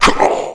BEATTACK

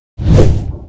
ATTACK

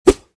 FISHING THROW

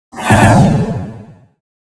ATTACK JUMP

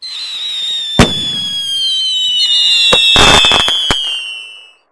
fireworks

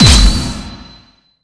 PVP START

